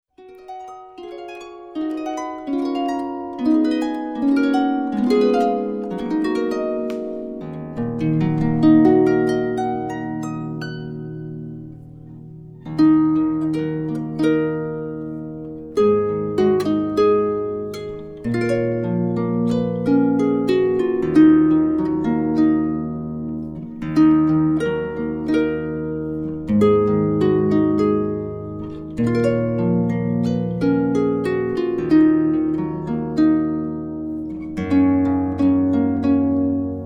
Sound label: pretty little horses, harp